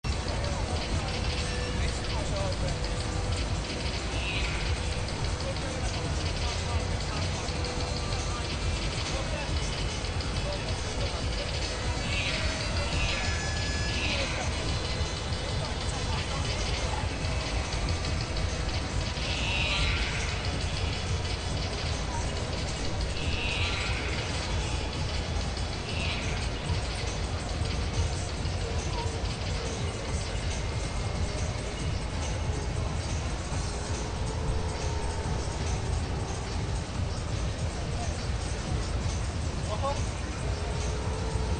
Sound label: oldskool gfx ambience